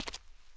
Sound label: step; WalkFX